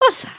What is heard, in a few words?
curtsey, emotes